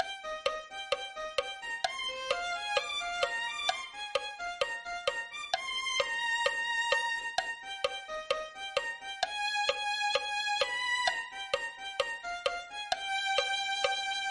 violin

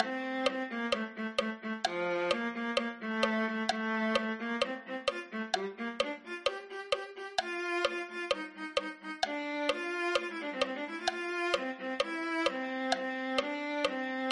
viola

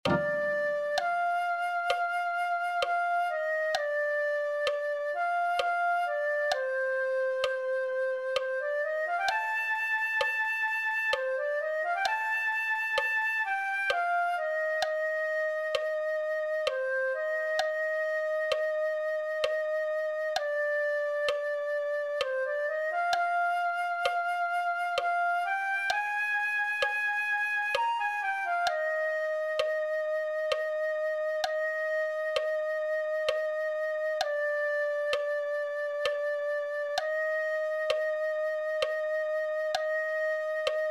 flute 1

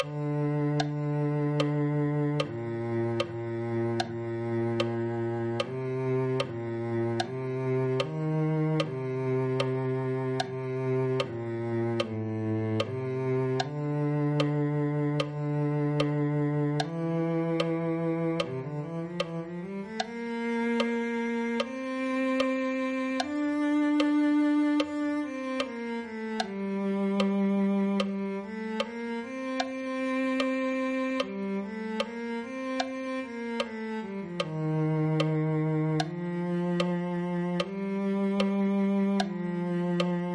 cello

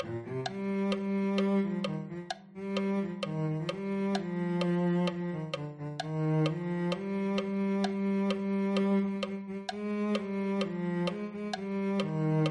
cello

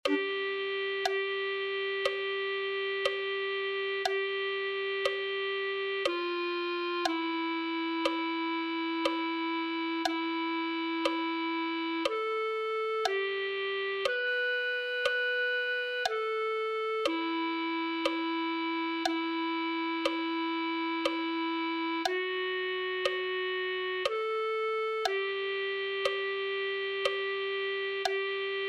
clarinet

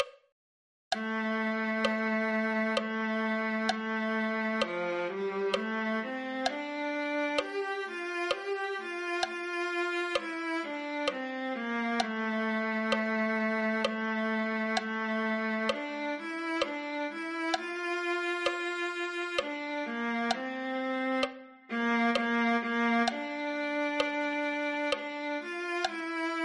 viola

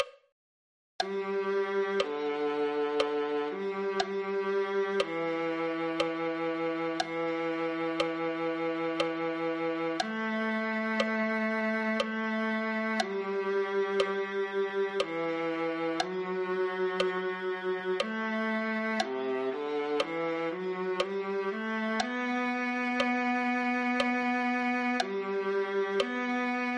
viola

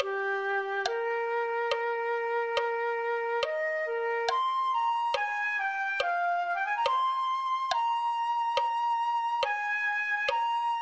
flute

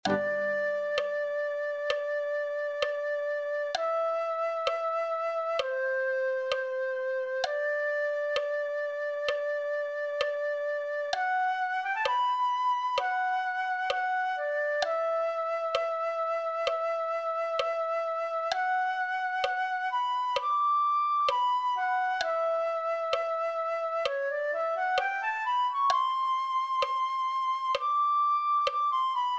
flute 1

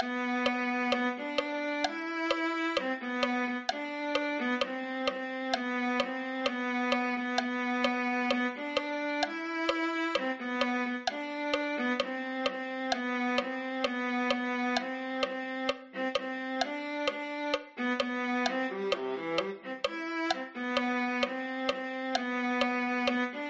viola

